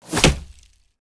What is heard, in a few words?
scatter hit